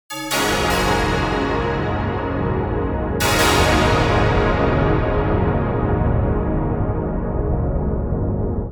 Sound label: Noise Glockenspiel, struck instrument simulator